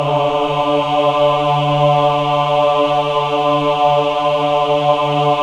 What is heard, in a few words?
men ah, Choir of Ahs; Roland - LA Composer vol. 1